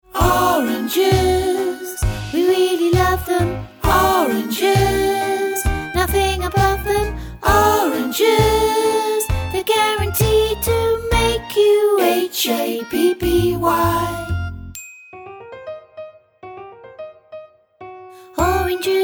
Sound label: Vocal; Around The World in 40 Minutes; Oranges